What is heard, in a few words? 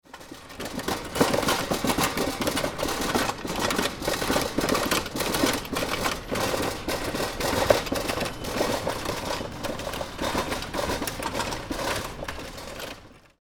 more rolling carts